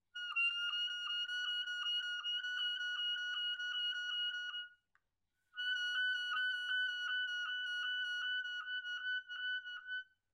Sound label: trills gis